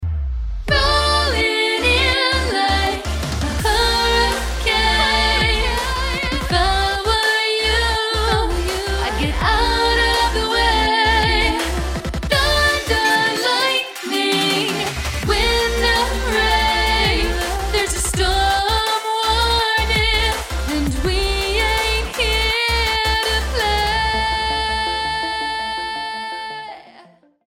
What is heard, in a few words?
Sample, female singers